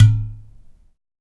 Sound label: UDU, African; Supreme Beats; Spectrasonics; SB Udus